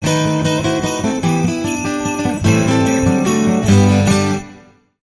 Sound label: We Wish You A Merry Christmas